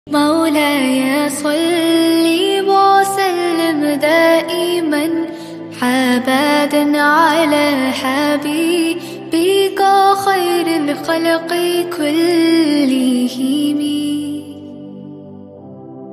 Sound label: arabic ringtone